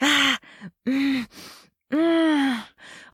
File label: Moan REV; Anna